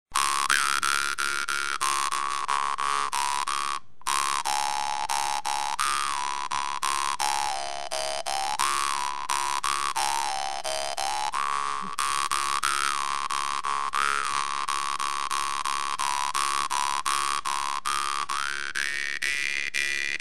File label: Bass Dan Moi